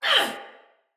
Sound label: Pain, Soldier Female; Sinecure Soldier Female A